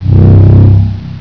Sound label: sphere